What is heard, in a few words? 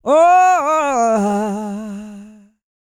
GOSPEL